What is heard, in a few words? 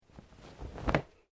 Clothing Kimono Sleeve Flap; Movemement sounds for various articles of clothing; Dressing; Human